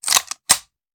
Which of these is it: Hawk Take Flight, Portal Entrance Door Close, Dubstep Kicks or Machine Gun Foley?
Machine Gun Foley